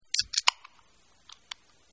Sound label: open soda can; Delicious Emilys Childhood Memories